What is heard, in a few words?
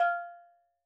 SteelDrums F5; Calypso; Percussion